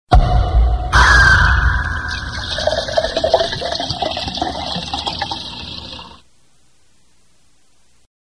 som de cerva

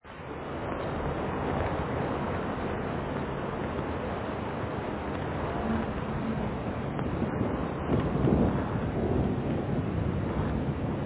chuva

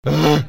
resmungo

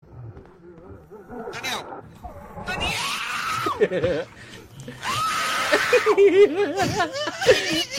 grito

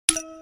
efeito coin

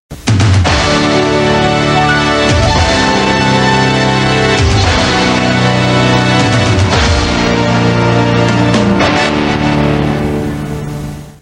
vinheta; intercine

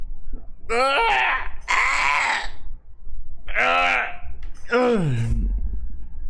espreguicando

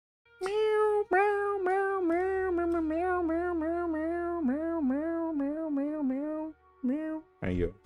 wall gato

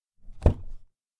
porta abrindo

